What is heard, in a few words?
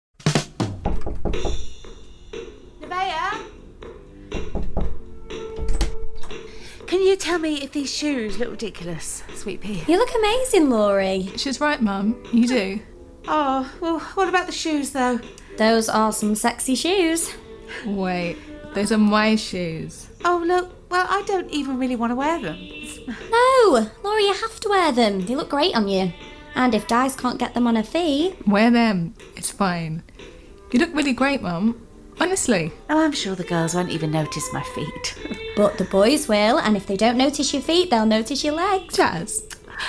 Jazz